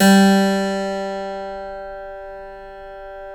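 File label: G2 clavinet right, Keyboards Acoustic; USB Soundscan vol.17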